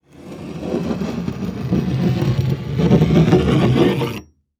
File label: Weapon Movement Axe Blade Scrape Across Wood; AdobeCC